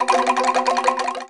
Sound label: Bone Tower Percussion Rattle; SFX Kit; Foley - Percussion